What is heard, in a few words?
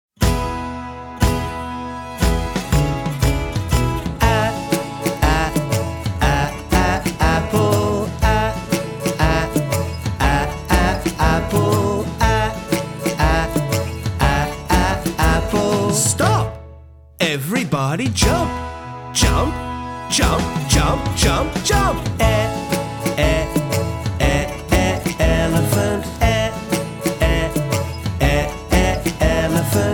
AEIOU Everybody Jump Phonics; kidsone english 2022